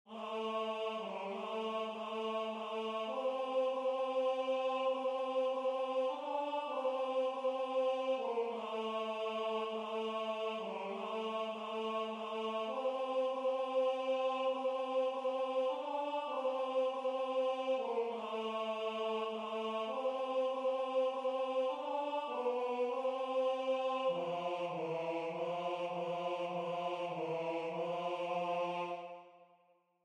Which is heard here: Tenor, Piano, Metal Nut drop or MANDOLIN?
Tenor